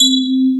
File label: Electric Pianos; Wizoo Electric Pianos